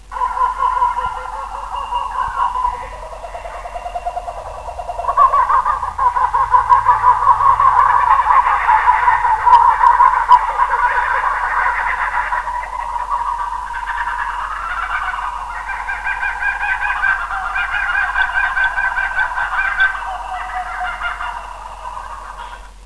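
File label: kookaburra; zoo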